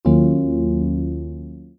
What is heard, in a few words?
synth